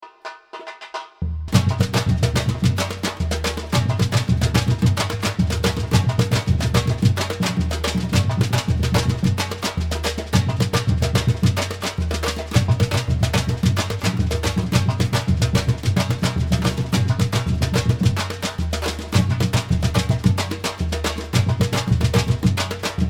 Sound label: Samba Afro; brasilian dance practice